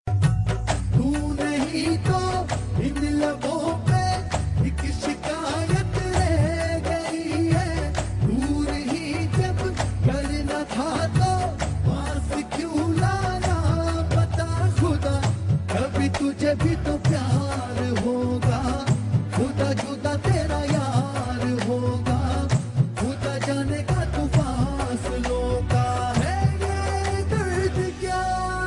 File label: Very Sad Tone